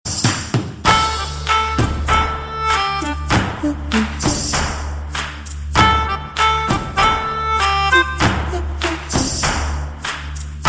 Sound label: Nanban mouthorgan